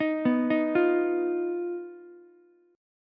Eb Suspended 2nd Sharp 5th, Ukulele; arpeggiated; chord; JGuitar

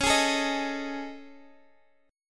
A Major 7th Flat 5th; chord

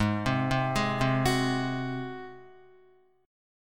Ab 7th Ab Guitar 4F,4A,5C,5G,5C,6E; Ab7 arpeggiated; JGuitar

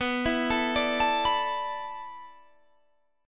A Suspended 2nd Suspended 4th

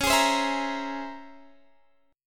C sharp Minor Major 13th, Guitar Open G

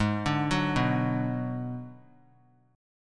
G sharp Minor 7th Flat 5th G sharp down; G#m7b5 arpeggiated; JGuitar

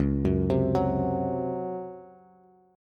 Db Major 7th Suspended 4th Db down Bass; arpeggiated; JGuitar

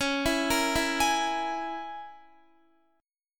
Db Minor 6th Db down Guitar; arpeggiated

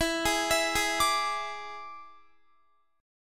E 7th E down Guitar; arpeggiated

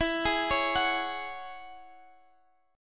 E 6th Add 9th E, Guitar Drop D; chord shape

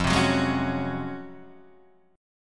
Db Major 11th F

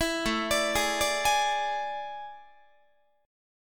A Major 7th Suspended 4th, Guitar; AM7sus4; arpeggiated; 19,17,17,18,18,19

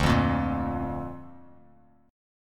D Minor Sharp 5th D down Bass Standard

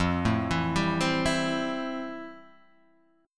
A-Minor 7th F; Am7/F arpeggiated; JGuitar

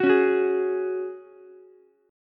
Gb Suspended 2nd, Ukulele; strummed; 11,13,14,11

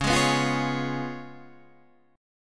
C sharp 7th C sharp, Guitar Drop D

